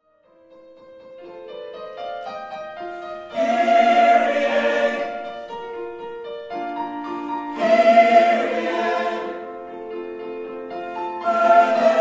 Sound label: Missa Brevis Kyrie